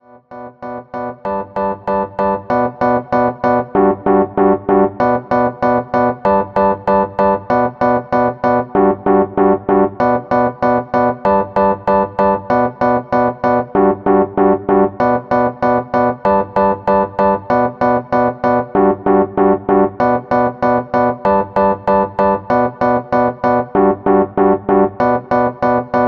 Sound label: Rhodes chord, hip hop chords; rhodes chord 08